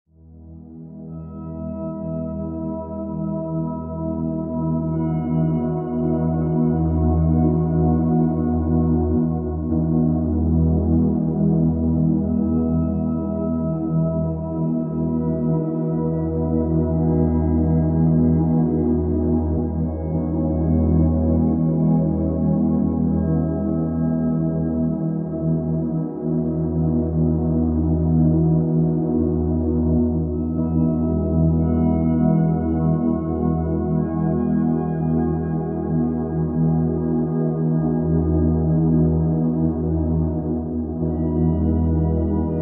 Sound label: 432 Hz Sound Therapy Sleep Healing 432 Hz